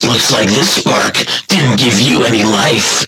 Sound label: Game Over